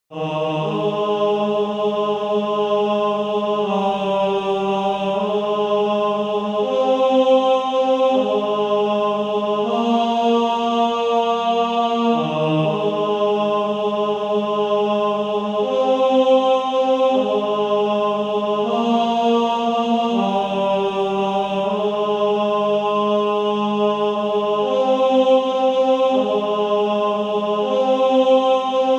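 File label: Male Choir, tenor 2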